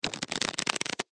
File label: music shuffle